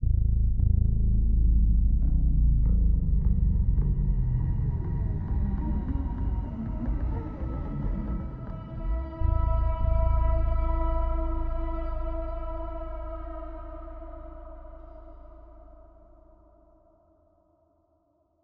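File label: Ambience Level; underworld ascendant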